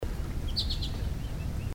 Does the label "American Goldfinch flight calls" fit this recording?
Yes